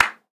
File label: Foley